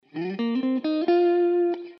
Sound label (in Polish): zagrywka blues G-F